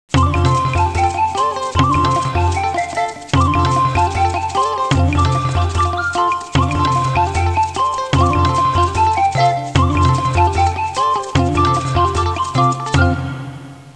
suonerie musica hawaiian

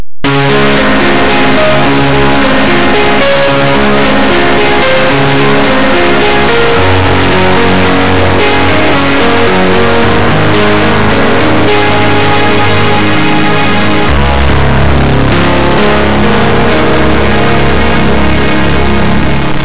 musica newage

